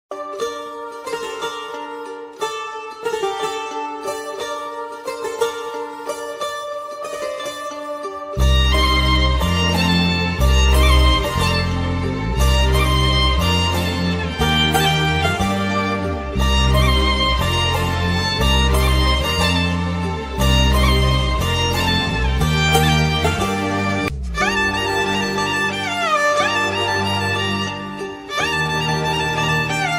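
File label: love background music ringtone; policeodu; actor vijay talapathi; samantha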